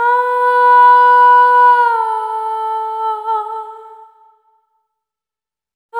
Single Voice Melodic Loops, Vocal Samples

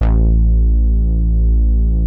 Prophet 5 A#2, Synthesizer; Hollywood Edge - Giorgio Moroder Rare Synthesizer Collection

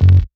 bass stab

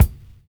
LOVE SNAR; Univers Sons

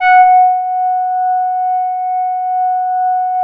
AMBER F#3, Smoov Leadz, Strictly Synthe; E-MU Producer Series Vol. 6 – Analogia Project

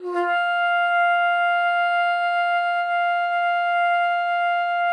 bassoon, F5; Xsample VOL-6

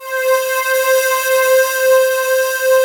pan pad C5; PANY PAD

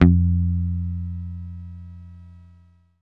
Basses, Roland

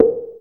NOISE BLOCK, synth perc; Zero-G; Ambient 1

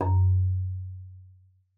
marimba F#2; Xsample VOL-4

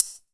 HH, CLOSE HH, House Side Of 2 Step; USB Soundscan vol.51; AKAI

